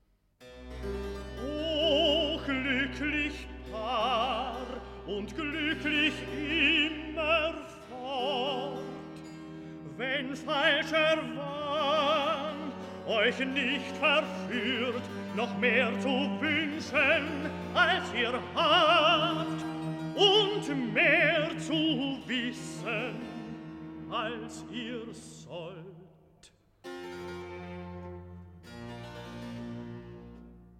Rezitativ, O glucklich Paar; Uruel

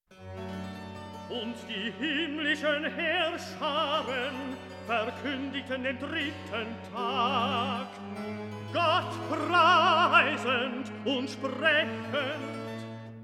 Rezitativ; Und die himmlischen Heerscharen verkundigten (Uriel)

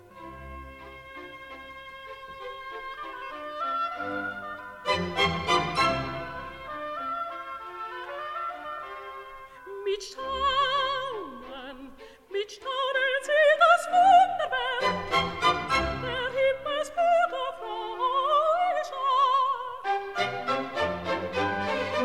Chor mit Sopransolo; No. 4. Mit Staunen sieht das Wunderwerk (Gabriel)

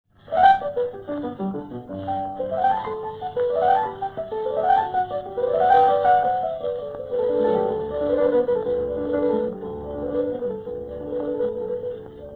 Sonate für Cembalo (für Klavier) G-Dur; Dinu Lipatti

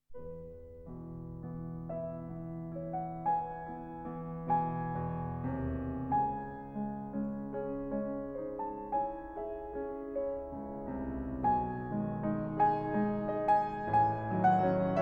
Variations in E-Dur, Piano; Chopin; 'Hexameron'

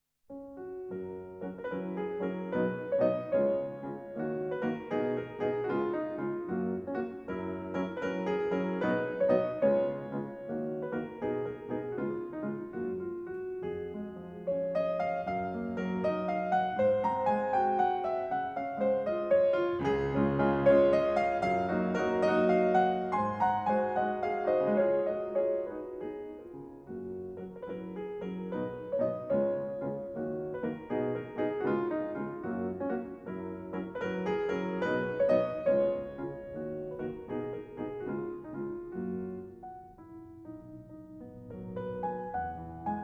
Contredanse in Ges-Dur, Piano; Chopin

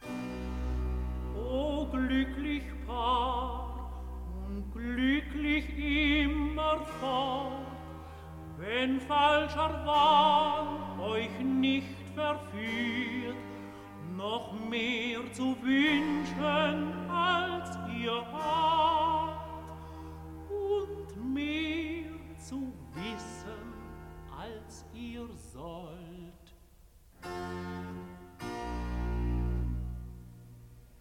Rezitativ, O glucklich Paar, und glucklich immerfort; Uriel

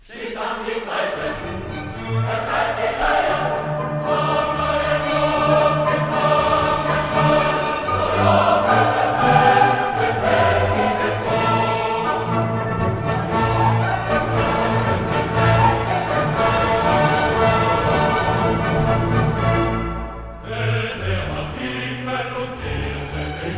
Chor; Stimmt an die Saiten

